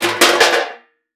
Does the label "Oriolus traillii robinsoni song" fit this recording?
No